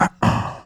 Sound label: VOX; USB Soundscan vol.32; Roots Disco; AKAI